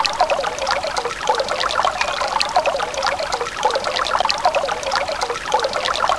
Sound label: water